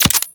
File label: Pistol Projectile Attach; Weapons; Warframe; Tenno; Guandao